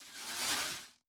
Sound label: Dark Beam Reflect